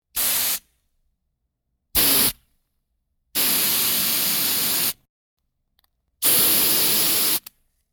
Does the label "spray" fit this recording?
Yes